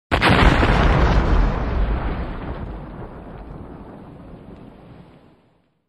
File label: Explosion Sound Effect